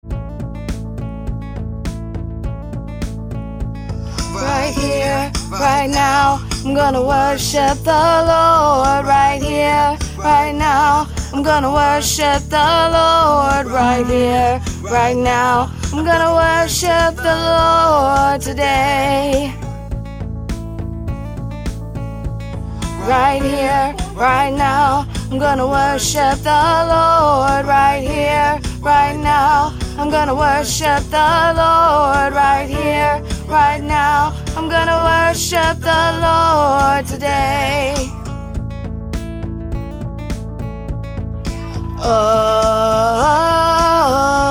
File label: Alto